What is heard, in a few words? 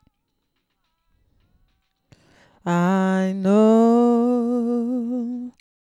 lead vocal